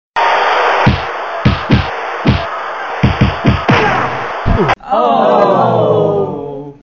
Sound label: boxing match